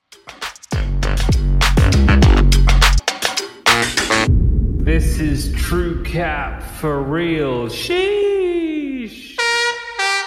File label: gangsta riffs